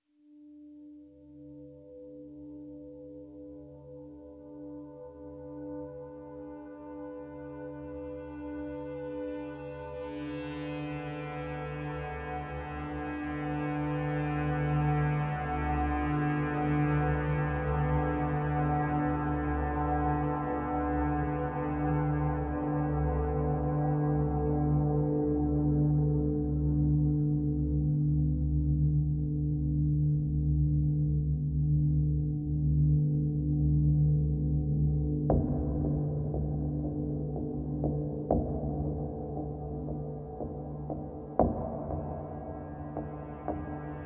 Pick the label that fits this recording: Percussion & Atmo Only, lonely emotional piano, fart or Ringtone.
Percussion & Atmo Only